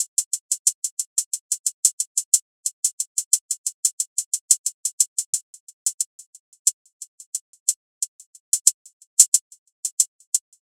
double hihat loop BPM 90; Bihu; waves of bihu vol 2; Gully Loops

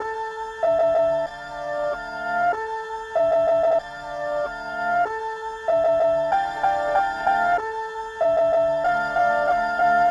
Melody Loop BPM 95 F Maj; Gully Loops

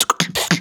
Beat Box Fill; aawaz

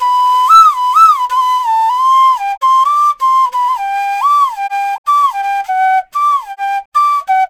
EDM Flute Loop 128 BPM G

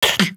Beat Box Snare; aawaz

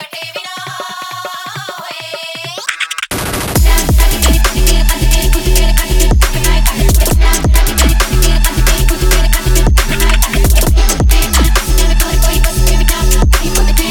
Drop Loop BPM 135 E Min With Drums; Bihu; Paragon; waves of bihu vol 2; Gully Loops

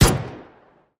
Gun Sound; Gangstar; Gully Loops

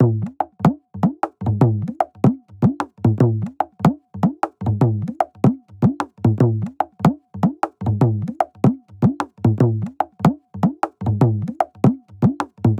Dogor Loop BPM 75; Gully Loops; waves of bihu vol 1

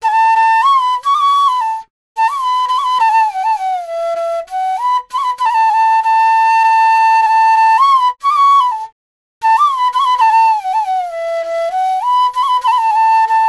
EDM Flute Loop 100 BPM E

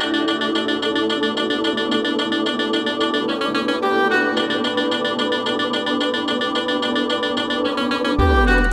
Drop Loop BPM 110 D Maj; Gully Loops; Batash; UDD Gaye Sample Pack

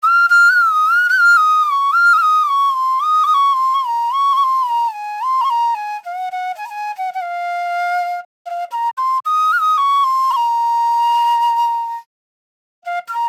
90s Flute Loop 110 BPM A

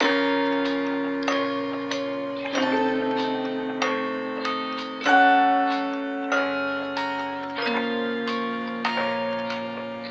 Melody Loop BPM 95 C Min; Gully Loops

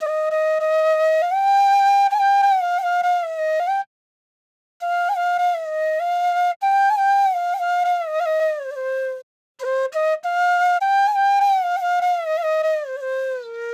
90s Flute Loop 100 BPM G

